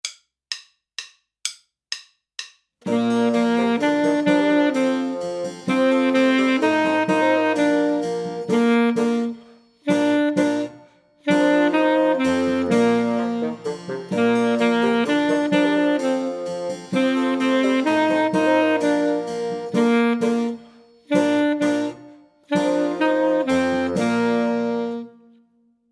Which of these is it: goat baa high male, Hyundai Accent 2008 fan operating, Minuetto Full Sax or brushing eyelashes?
Minuetto Full Sax